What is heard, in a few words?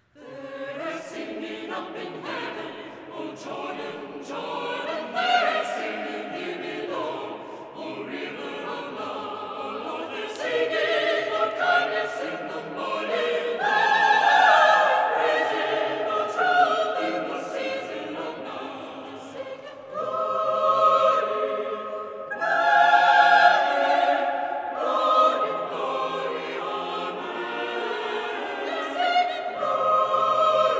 There's singing up in heaven; Live, documentary recordings made during Cathedral liturgies.